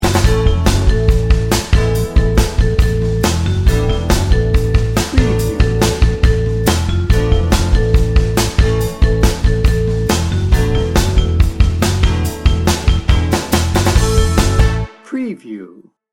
motown metro

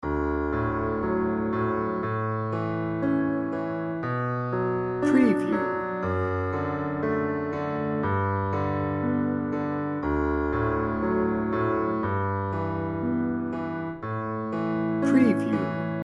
pachelbel canon in d loop

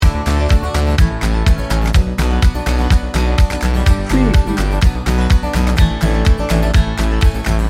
happy carefree indie pop loop

